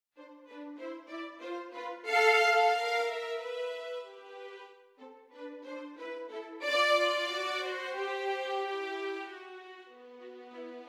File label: Duo 2 violins; Duo n5